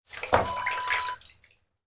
water scoop

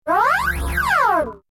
Spaceship Door Open

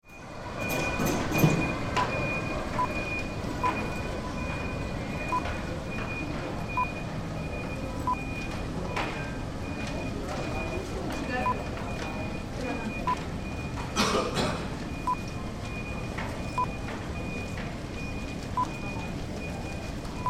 Terminal 3 Ambience with Luggage Vehicle

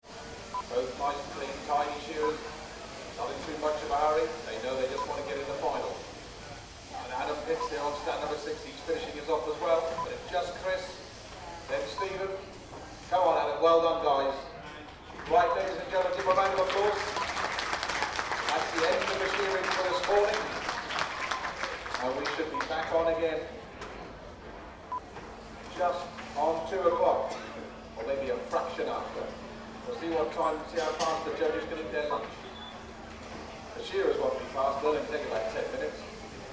Sheep Shearing Competition

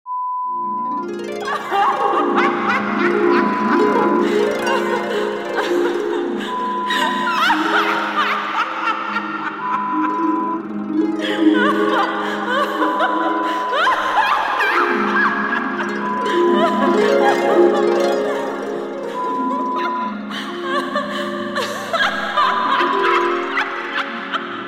Demented Lady, Horror Sound Effect

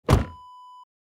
car door close

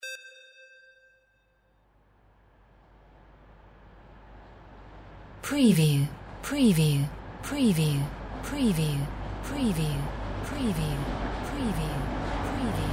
train intercity passby; windy beach audio

